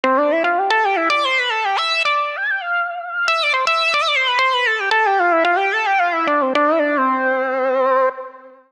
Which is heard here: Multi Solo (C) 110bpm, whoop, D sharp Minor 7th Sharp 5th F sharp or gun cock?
Multi Solo (C) 110bpm